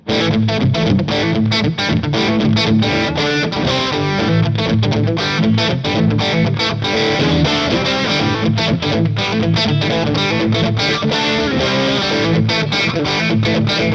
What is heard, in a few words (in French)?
vintageplus DISTO